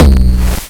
LOW NOISE TOM; drums pads; noises

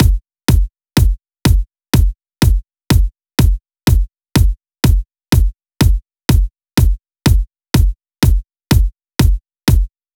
Percussion Kick Drum; drums pads

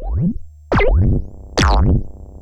NOISE FX

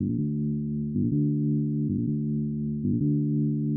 bass

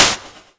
snare white

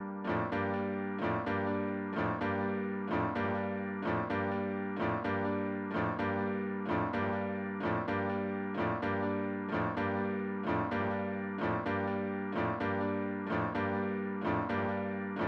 lead

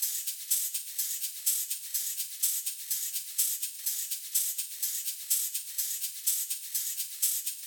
perculoop

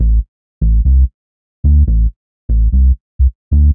128 ACD BASS

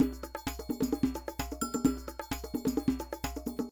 Loop Percussion 130; drums pads

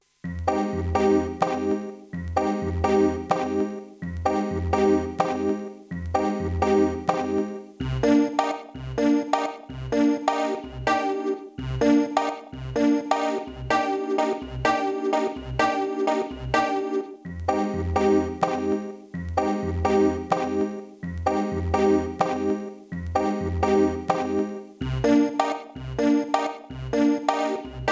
synthe tension

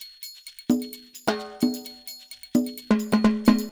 Loop Percussion 130

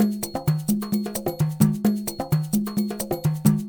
Loop Percussion 130

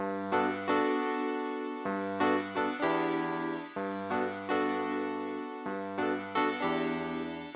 piano pause

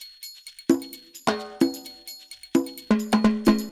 Loop Percussion 130

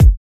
kick; maschine

